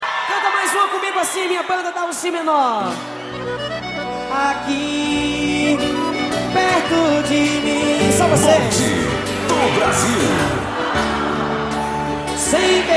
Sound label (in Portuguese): acustico ao vivo